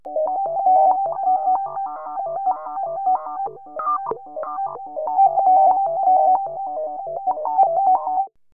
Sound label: ARPEGGIO, RHYTHM; AMG - Vince Clarke Lucky Bastard